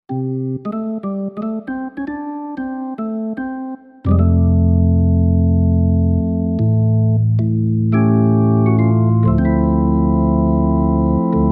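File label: organ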